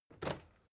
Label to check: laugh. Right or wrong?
Wrong